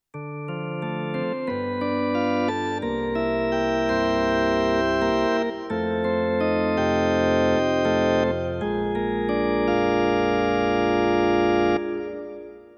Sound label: Drawbar Organ